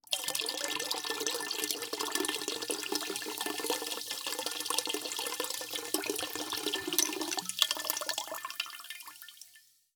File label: Burst, glass, release, bottle, pour, fizz, cistern, midlevel, choppy, marble, rattle; Eiravaein Sound